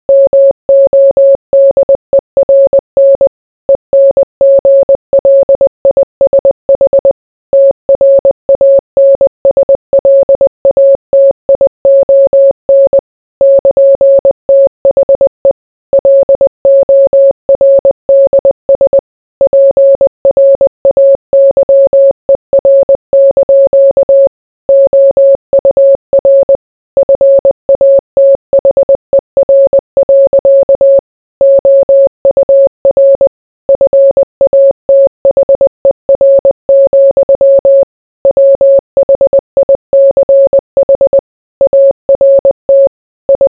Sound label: morse